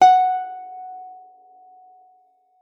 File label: F#5, GUITAR; Xsample VOL-2